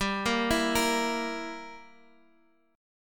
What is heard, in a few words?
G Minor 6th; arpeggiated; Gm6; x,x,5,3,5,6